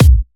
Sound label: 128BPM Kick; Vengeance Samples; VFH1 128BPM Basique Ale Kit